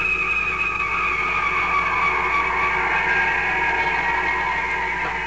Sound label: beam ship; Here is what a Beam Ship sounds like.